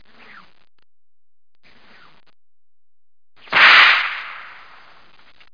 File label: whip